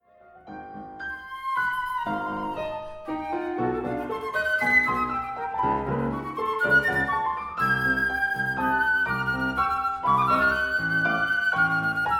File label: duo sonata for flute and piano